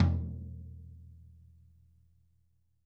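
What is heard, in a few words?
floor tom; drum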